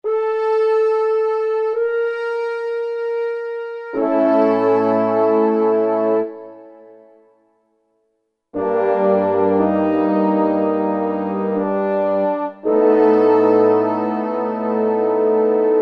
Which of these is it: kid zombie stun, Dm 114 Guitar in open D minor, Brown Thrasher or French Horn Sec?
French Horn Sec